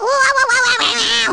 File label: baby wawawawawowow; baby luigi